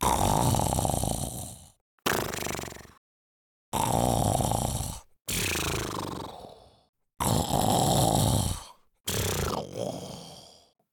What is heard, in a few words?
snore